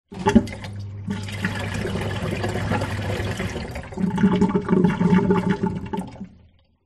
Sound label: household washing machine empty drain gurgle short